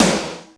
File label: D70 SD, D70 DRUMS; Crypton - DrumBase5000